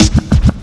SD, Dance Drums

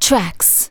vox, Techno Trance; Ueberschall

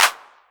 808 Clap; Novation Drumstation

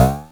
Drums, Hits; DCM8

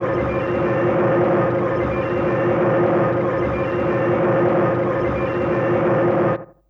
Crude Tape Loop, Tape delay; radiosonics

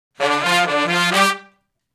Shuffle Blues Riff (G) unison, Horncraft for R&B; Sections

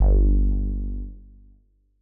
Elec Bass G1; KG MegaSaur

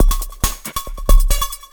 percussion loops, Techno, 138

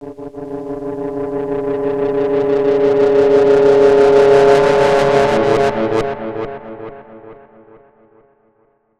FX DIST; FX DISTO

